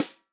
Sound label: snare drum, POP SNARE CHROMATIC; Best Service ProSamples vol.43 - Real Drum Kits